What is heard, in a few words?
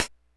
hihat, Hardcore Breakbeat 152 Dmin; Big Fish Audio; Hardcore Breakbeat Action; Gone Insane